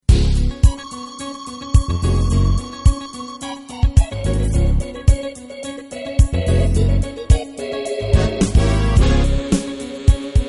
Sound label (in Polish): instr